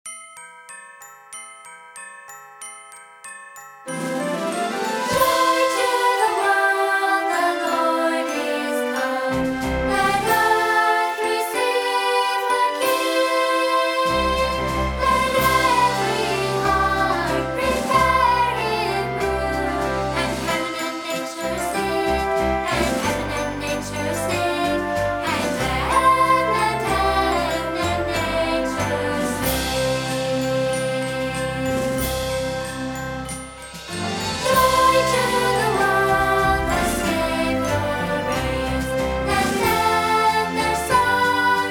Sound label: joy to the world with vocals